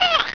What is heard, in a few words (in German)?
ambient wasserfall; hurrican; SecretPlanet